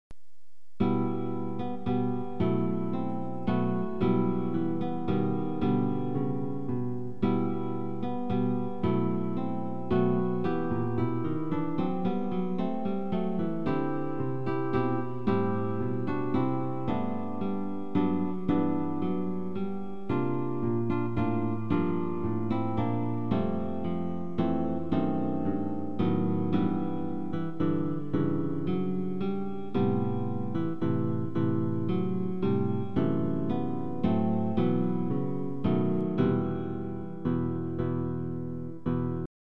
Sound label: suite guitar